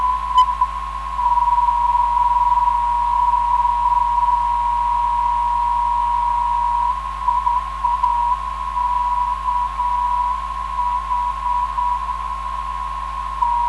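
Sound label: non-directional beacon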